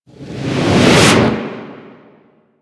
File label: Environment Explosion; Proj F; Mission Four; Warframe; The New War